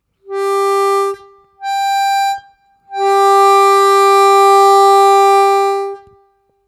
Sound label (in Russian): +3+6, октавы, губной гармошке; блокировки языком